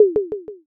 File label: tsuzumi